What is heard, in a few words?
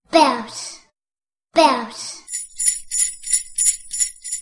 bells